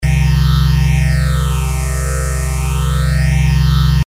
Phaser, creating a sweeping, whooshing sound that's often associated with psychedelic music.; effect uses an LFO to modulate the phase of a duplicated signal; low frequency oscillator